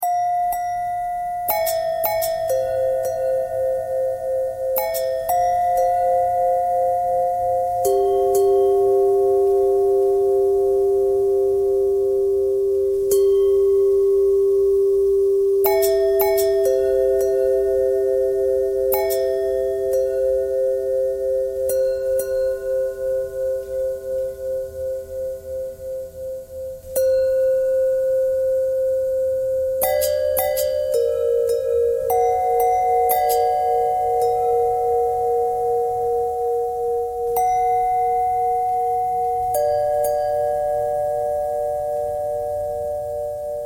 longbell bell bendthread; bells

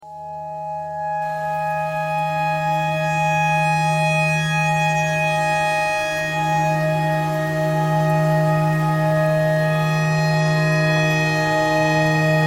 tornado siren clarinet notes e thread simple

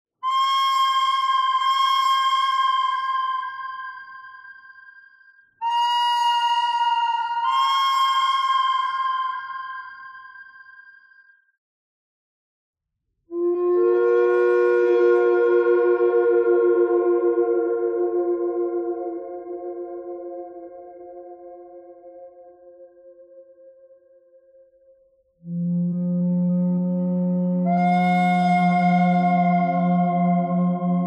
bird thread pentatonic; noreverb

